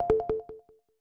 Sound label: pong